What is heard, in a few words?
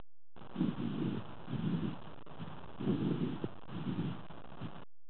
normal, zvuky plic